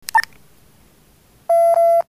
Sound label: beep